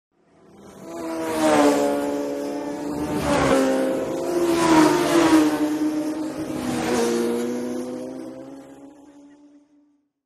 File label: Motorcycle Road Racing